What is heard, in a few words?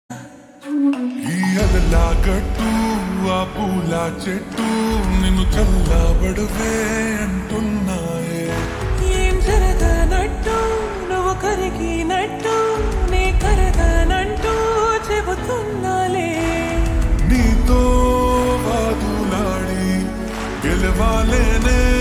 Slow Reverb Version Ringtone, telugu